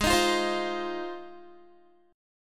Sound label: Ab 6th, Guitar; Ab6 strummed; 16,18,15,17,17,x; 4E,4A,5D,5G,5C,6F